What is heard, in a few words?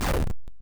Enemy Hit